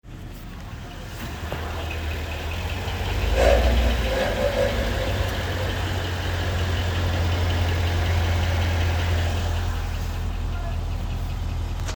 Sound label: engine noise, buzzing, 2013 LR4 5.0 V8